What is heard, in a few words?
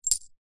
bell very small single ring